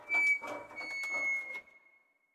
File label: Creak Med